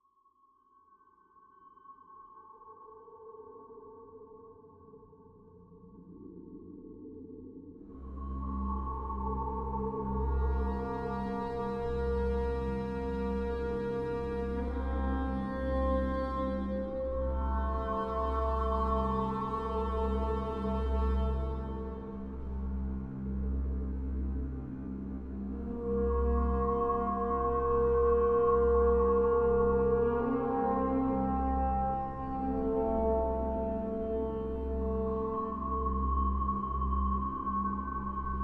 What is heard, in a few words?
Deep Ocean - Reverb; AquaDream Soundtrack